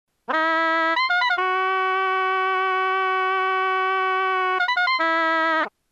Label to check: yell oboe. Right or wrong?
Right